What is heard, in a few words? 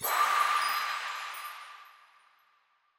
round start swoosh; CrossCode